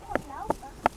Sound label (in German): Auf Holz klopfen